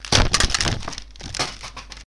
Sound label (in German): Krach